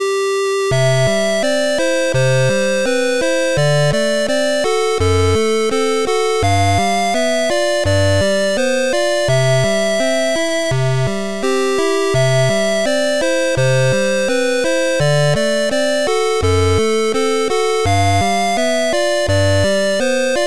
8bit Peaceful Village

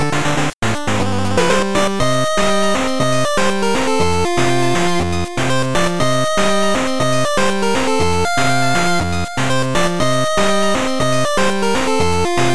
8bit Passionate People, レトロゲーム風のラテンっぽい曲です。; 【8bit フリーBGM】ラテンっぽい情熱的な曲; 情熱的な人たちが住む町のBGMにぴったりだと思います。1ループは短め。

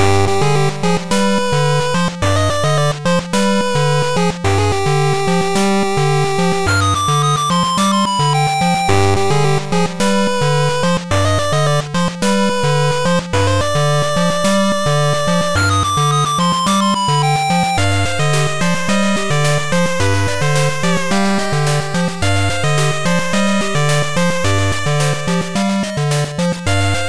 8bit

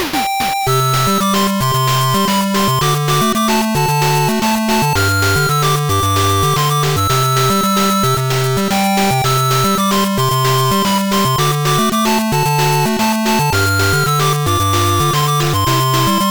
8bit Peaceful Town

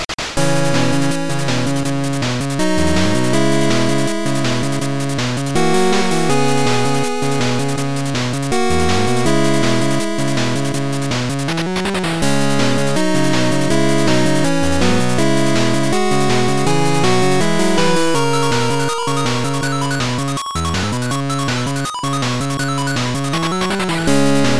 8bit Pull The Trigger

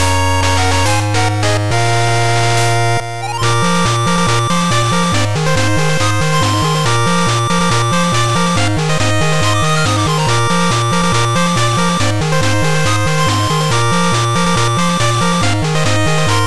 8bit Victory

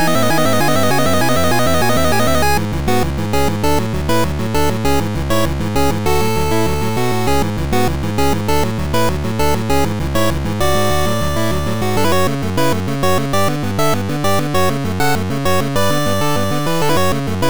8bit

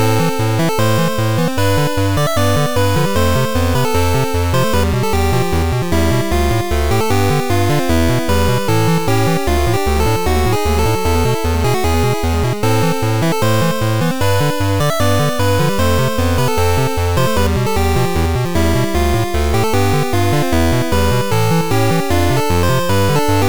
8bit Seeking Truth

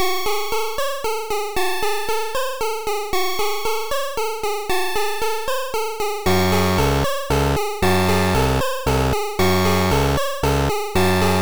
8bit

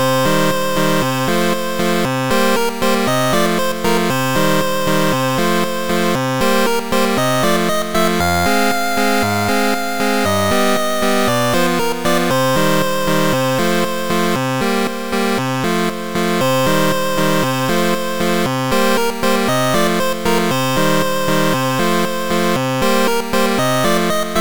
8bit

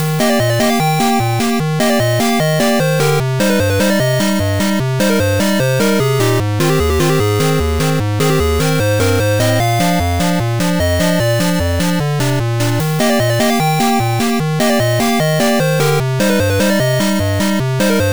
8bit Bustling Port Town

